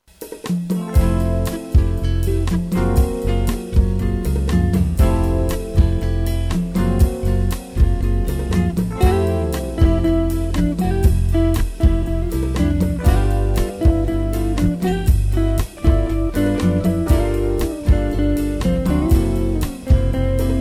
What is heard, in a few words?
instrumental